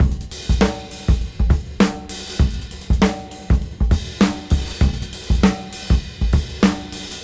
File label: Swing 98 BPM; AMG; Keith LeBlanc Phat Butt Jams